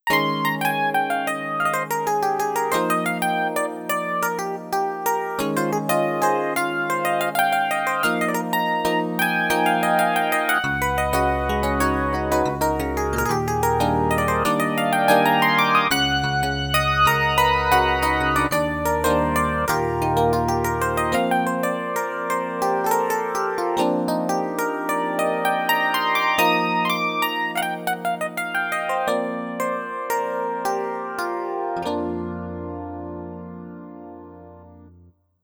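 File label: digital electric piano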